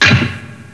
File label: SW ROCK; Thief